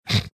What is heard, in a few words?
snorting